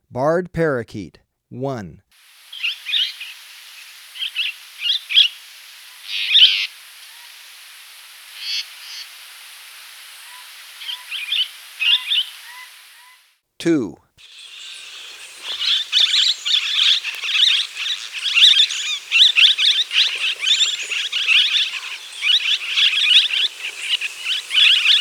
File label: Barred Parakeet, Birds of Ecuador; S. America